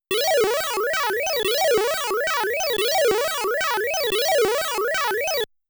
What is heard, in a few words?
Microwave Take Off; Mappy